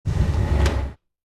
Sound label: Field Object Gate Close; starbites